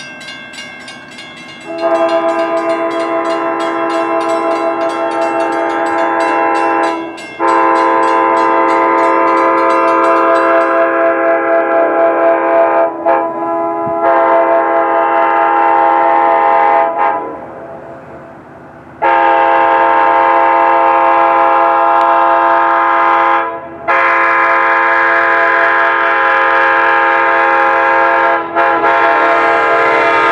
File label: demolishing, K5LA, horns; S. Oyster Bay Road Jan 2018 Hicksville; lirrhorns